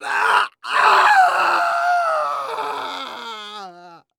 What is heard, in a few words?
die